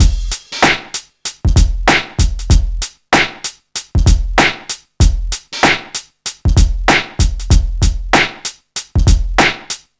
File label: 96 bpm drum beat f key